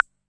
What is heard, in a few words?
rim, Roland TR-66; drum machines